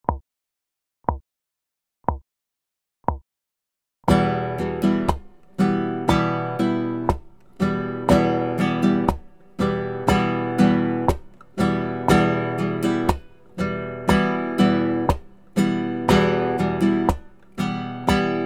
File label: strumming